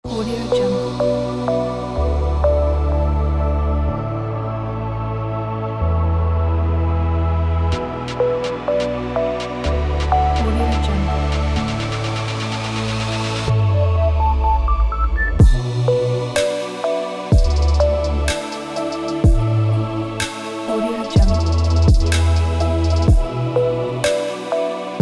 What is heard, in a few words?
slow motion music